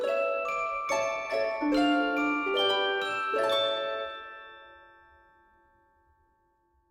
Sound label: Win; Soraya